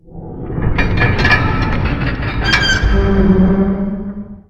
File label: door; Shin Megami Tensei IV Apocalypse; 3DS